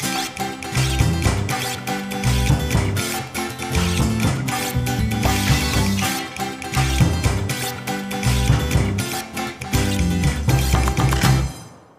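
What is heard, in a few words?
food world music inferno no vocals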